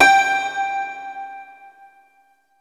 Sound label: HARP G3 L, SYNTH PLUCK; Music Store - Claudius Diemeter Schwerlos